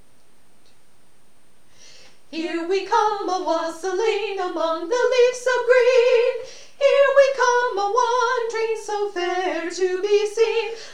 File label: wassailing song